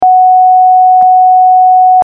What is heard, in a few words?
Steady